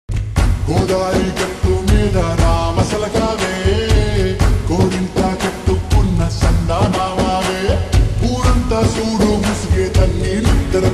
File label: Slowed Reverb, telugu; sankranthiki vasthunam